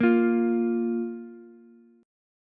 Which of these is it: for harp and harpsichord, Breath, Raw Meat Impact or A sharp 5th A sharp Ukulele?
A sharp 5th A sharp Ukulele